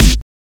dope snare g sharp key, acoustic snare tuned to the G# note; snare drum one shots